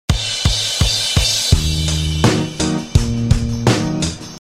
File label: levelstart